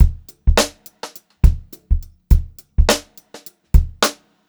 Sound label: Funk Groove Drumloops; USB Soundscan vol.38; AKAI